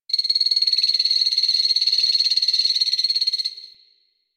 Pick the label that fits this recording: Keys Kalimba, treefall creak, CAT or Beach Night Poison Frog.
Beach Night Poison Frog